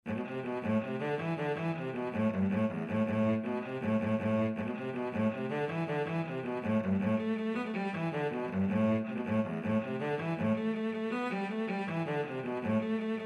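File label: violoncello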